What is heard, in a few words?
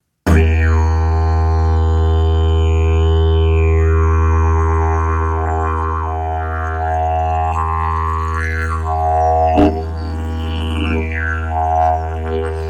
didge